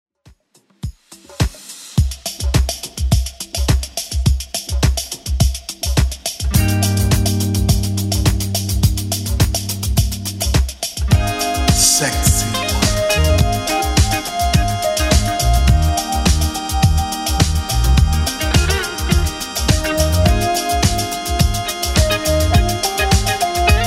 70s Redrum 105 bpm CLEAN

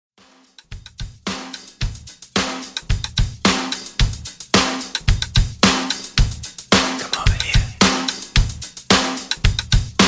80s Funk Rock Redrum 110Bpm

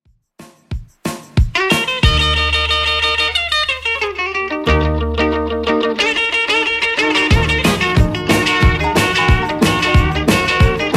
50s Redrum 182bpm CLEAN

